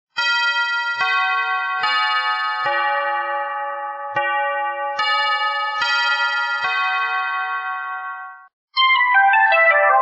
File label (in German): 4 Melodien